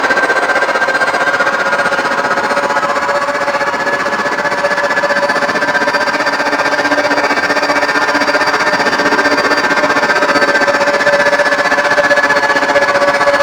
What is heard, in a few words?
Archwing Healer Engine Loop Fast; Warframe; Vehicles